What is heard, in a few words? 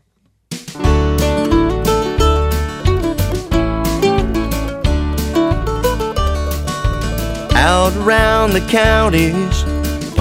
Bluegrass